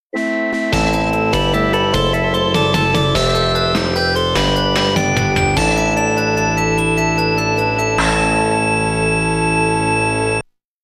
All Clear; Akumajou Dracula; X68

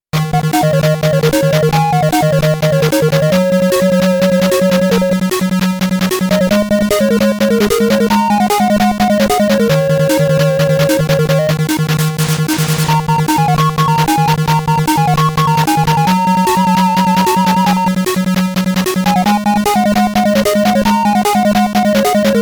Music; Pipe Dream; NES

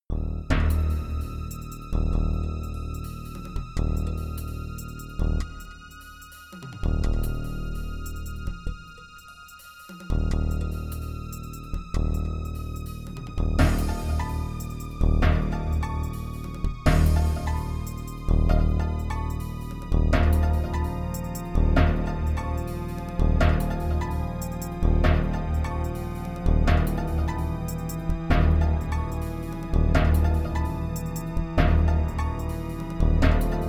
Suspense; Fade to Black; DOS; Alcatraz Suspense

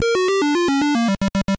Game Over, NES; Arkanoid

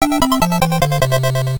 Death; Little Nemo; NES

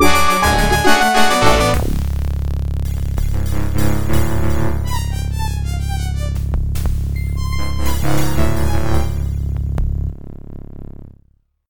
Death; Jagged Alliance; DOS

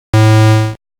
Incorrect Guess; Where's Waldo; NES

